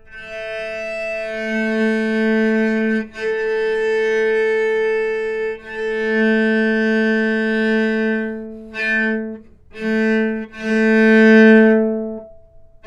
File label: A3 - Sustain, novice cello